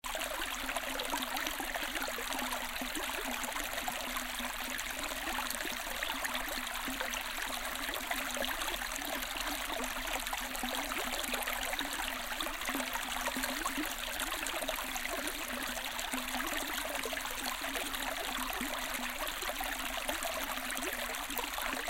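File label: river mouth water flows in the river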